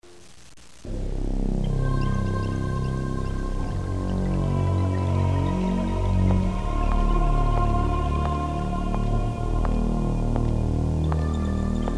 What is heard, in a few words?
spooky Music; Music from the Cannonball Express